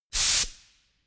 pneumatic pump

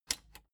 plastic button latch squeak click enable slow

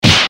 fist hit seq

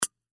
opening a beer bottle

